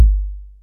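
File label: 808 kick, drums perc, Roland; Rubber Chicken Software Sample Bank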